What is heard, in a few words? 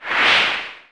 pose